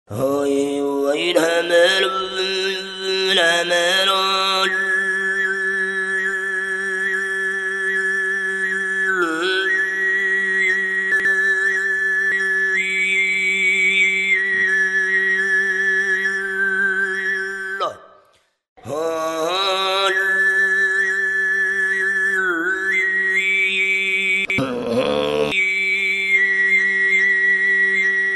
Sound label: sygyt